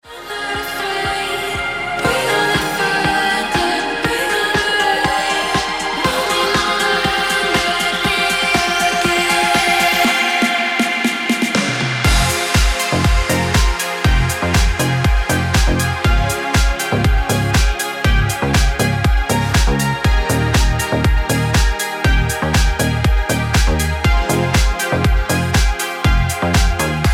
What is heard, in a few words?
Ringtone